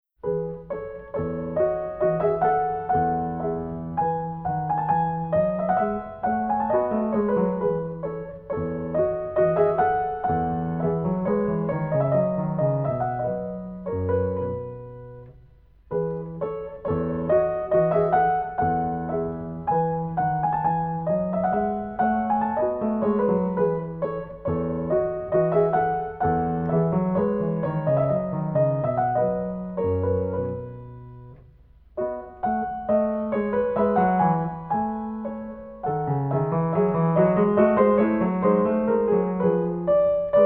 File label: French Suite E Major Gavotte; J.S.Bach; BWV 817